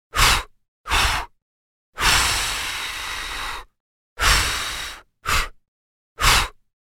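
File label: person blowing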